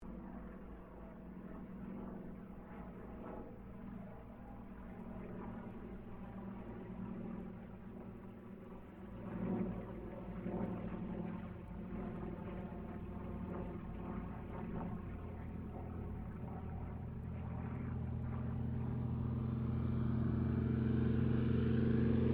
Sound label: AIRCRAFT DOUGLAS DC3 PASS; Qantas 1942 DC3; Airplanes (Sound Effects:Vehicles)